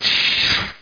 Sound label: P BURNED; rott